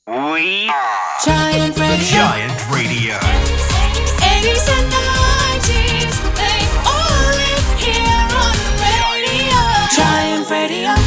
Sung Jingle Radio 124bpm Abmaj Slogan; Giant Radio

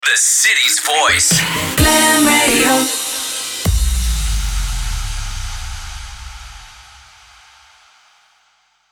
Sung Jingle Radio Cmin 128bpm Sting; Glam Radio

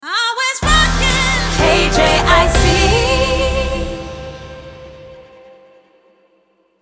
Sung Jingle, 125bpm C Minor, STING; KJIC 2020

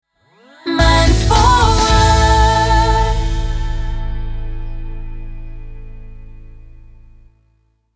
Sung Jingles, 110bpm, STING; MUNforward

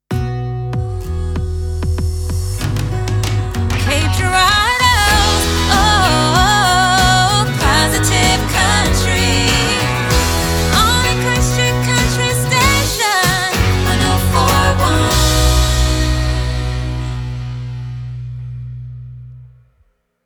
Sung Jingle Positive Country 96bpm SLOGAN

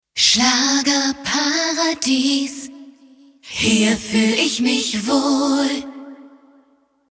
Schlager Jingle Acapella

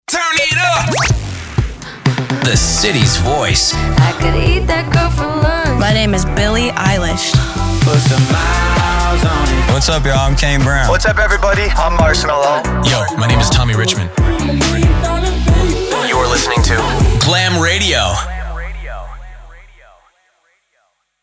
Hit Mix, Radio; Glam Radio

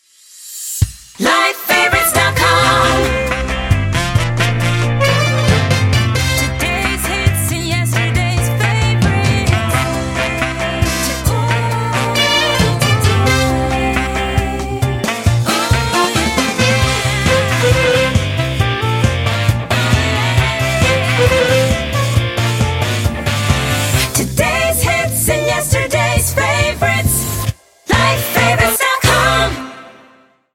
Jingle 134bpm

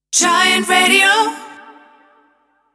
Sung Jingle Weather Acapella; Giant Radio

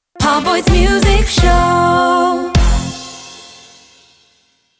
Jingle Sting 128bpm

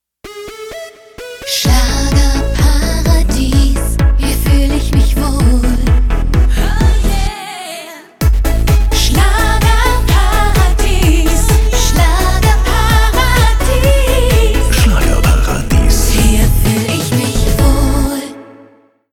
Schlager Jingle Slogan

